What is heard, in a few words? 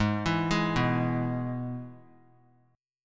Ab Minor Sharp 5th Ab down; Abm#5 arpeggiated; JGuitar